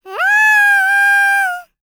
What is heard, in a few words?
LICKS, Vocal Planet Jazz FX; Spectrasonics